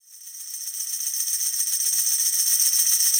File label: Tambourine Roll Fade In, Latin Percussion; Sonic Foundry (Sony Creative Software); Processed